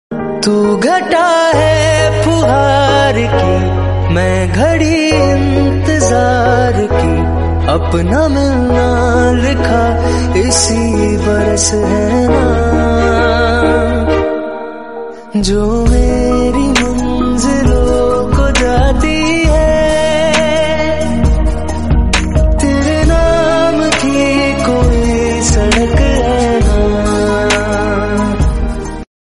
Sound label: hey korea romantic beautiful korean korean mix